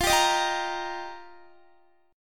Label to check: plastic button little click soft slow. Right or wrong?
Wrong